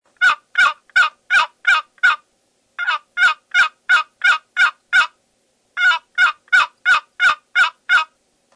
indeika zvonkii aktivnii monotonnii

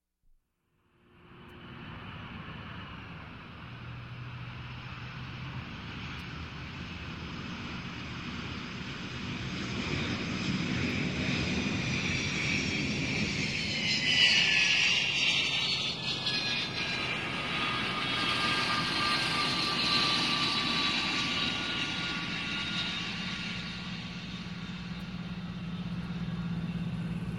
posadka reaktivnogo samolyota

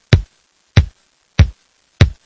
udari myacha ob pol

